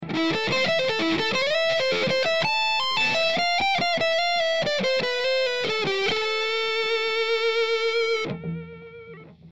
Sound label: Distortion Shred